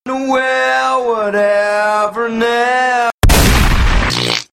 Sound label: shotgun